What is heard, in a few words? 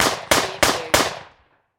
Submachine Gun FN P9; Sound Effects Bible